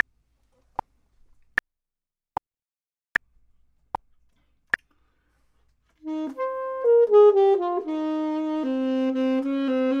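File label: top, Saxophone and Clarinet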